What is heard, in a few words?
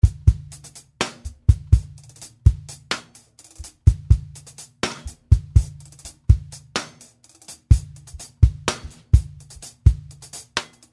Acoustic Drums